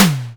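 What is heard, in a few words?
snare drum, Simmons SDS8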